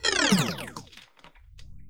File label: phasored